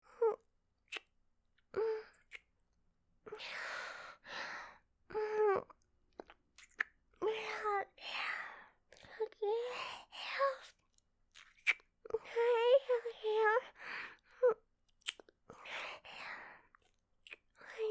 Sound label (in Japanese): キス (ラブラブ ディープキス); 純真無垢な妹の無邪気なゆ～わく